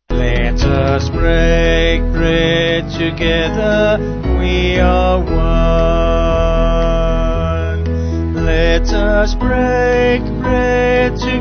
Let Us Break Bread Vocals Band, Let us break bread together, (Spiritual); Sung Lyrics